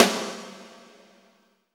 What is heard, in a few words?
SNR, Roland; Real Snrs